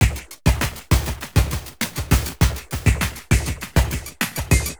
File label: Beat, Industrial